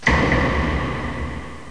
fall; ReversiReal; DOS/V Power Report 2002 July

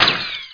impact; SpecOps2MPlayerDemo

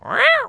Cat; FRACTIONS PIZZA

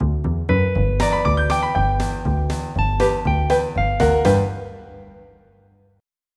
country

